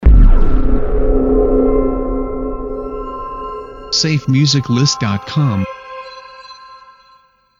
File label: Haunted Chimes Logo; SafeMusicList